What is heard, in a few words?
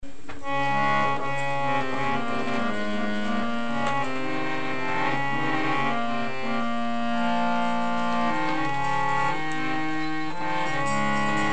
kirtan; Youth Kirtan Darbars; Edmonton Rainsbhaaee; maan karo thudh ooparae maerae preetham piaarae